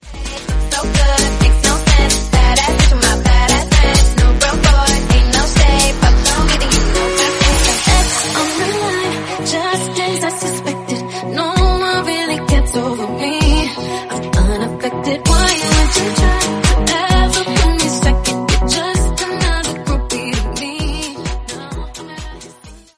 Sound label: 130bpm Abm